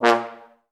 TB STAC A#1; Best Service; Peter Siedlaczek's Advanced Orchestra

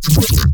glitch stab; Virtual Riot; Glitch Stabs

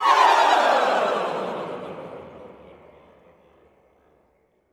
CHR FALL, Classical Choir; Best Service; Extended Classical Choir; FALLS

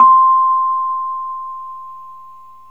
Rhodes C; 3D Sound; 3D Keys VOL-1

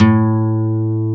YP A2, Acoustic Guitars; AKAI S6000 CD-ROM - Ultimate

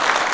Fight Hopper loop; SNES; A Bug's Life (Super Gameboy)

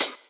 Closed HH

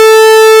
J60 Pwm A3; Proteus 2000; Digital Sound Factory Proteus 2000

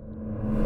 Tails, Drum Bits, Verb Tails; layering

